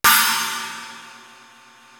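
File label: combo crash, cymbal; East West; Global Sounds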